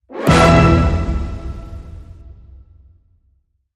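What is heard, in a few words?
Hit Orchestral Glam E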